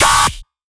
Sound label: Dm Riddim Shot